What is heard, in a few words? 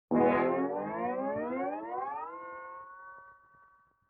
Guitar Slide Up; Vintage Cartoon; Musical Elements